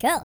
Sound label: E TALK, Vocal Planet R&B & Dance; ILIO; Vocal Planet VOL-4